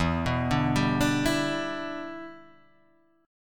E Minor 7th, down; arpeggiated

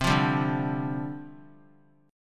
C Suspended 2nd Suspended 4th, down; Csus2sus4 strummed

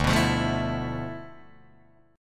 D Minor 6th Add 9th, Guitar, DADGAD

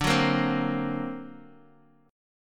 D Augmented 7th Bass Standard, chord; 22,21,20,17; strummed; JGuitar

